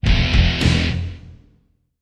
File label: Music Modern Rck Dru, guitar